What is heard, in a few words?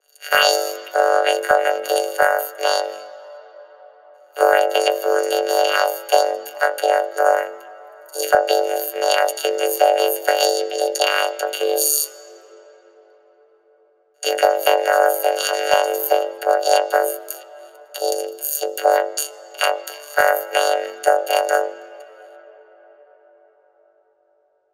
robot